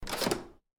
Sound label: open; Treasure of Nadia